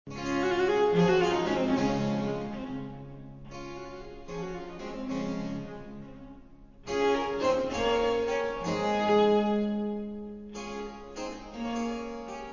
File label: Marais D III Rondeau fin